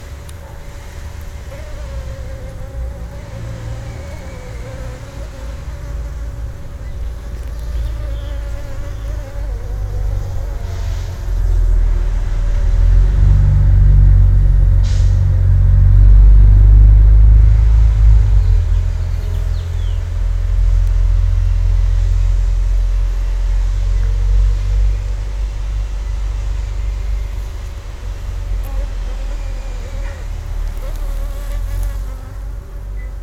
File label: Backyard Bees, Construction, Traffic, binaural